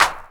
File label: DR. LOOP, DRUMS; X-Static Goldmine 3